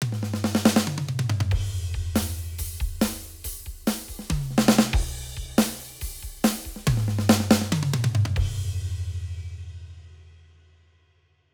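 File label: Modern Country Comp Half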